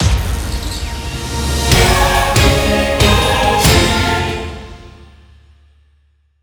BOSS VICTORY

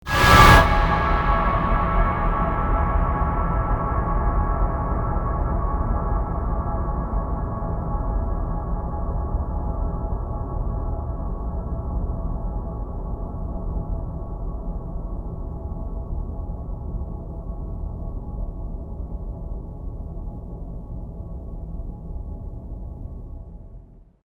Shock; Resistance 2; ps3

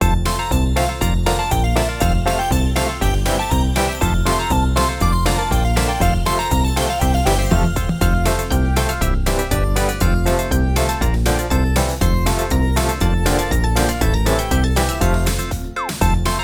BGM Gameplay Electro

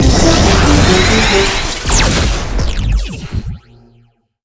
round win

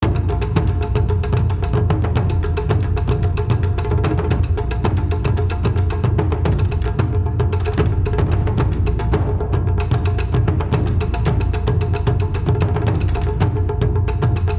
Battle Drums (Loop)

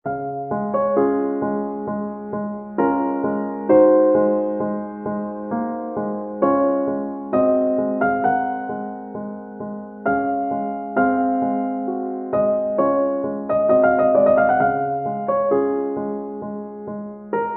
Raindrop

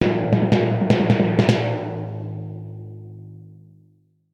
UI prestige reward; Armello The Bandit Clan

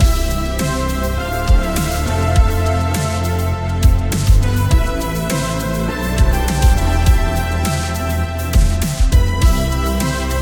Mission Music

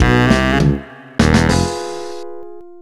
BGM Lose; Foto Frenzy Spot the Difference; NDS

